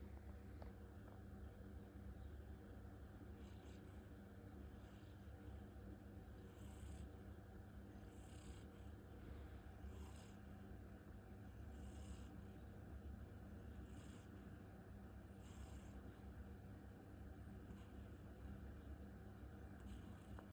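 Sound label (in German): Katze macht öfter seltsame Geräusche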